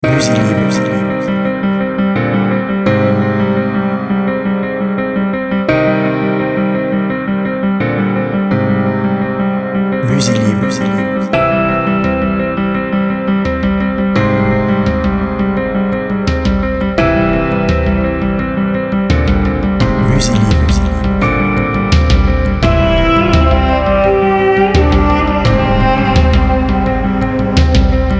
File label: intense